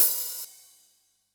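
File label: hi-hat open, Yamaha DD-65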